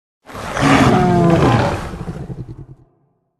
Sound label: troll idle combat roar; elderscrolls